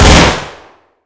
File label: glock18, weapons; svencoop